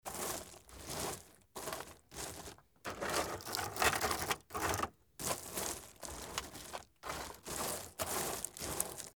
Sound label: gasagasa; Ogakuzu